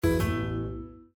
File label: Windows Hardware Remove